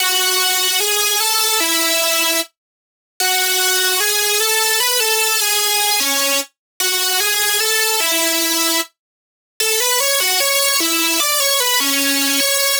Lead Loop F# Epic Melody, Dubstep, 150bpm; Ghosthack; Dubstep Sound Arsenal

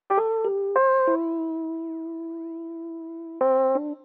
Lofi Melody Loop - 90 BPM C Maj Pluck; Melodies; Loop Stems; Cymatics; Lofi Starter Pack; Eternity

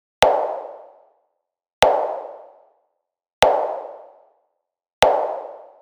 Drum Loop 150 BPM Snare; Experimental Drum Loops; Loop Stems; Cymatics; Mystery Pack Vol 4; Arrival Drum Loop

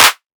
clap oneshot; GhostHack

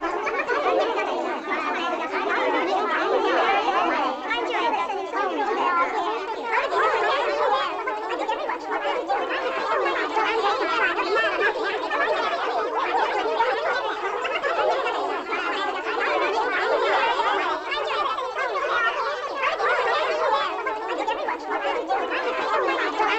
Hi Pitch Crowd Walla, Vintage Cartoon; Comedy Vocals

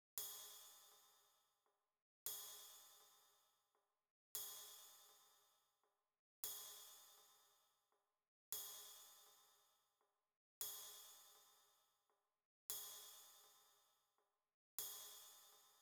Drum Loop 115 BPM Cymbal; Loop Stems; Cymatics; DOPE DRUMS VOL. 1; Coffee Drum Loop

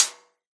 Hat, Lo-fi; One Shots; Ghosthack; Drum Mega Bundle Demo; Hip-Hop & Lofi Drums

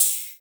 Open Hat, Latin Drum Kit; Drum One Shots; Guaya; Cymatics; PARADOX II

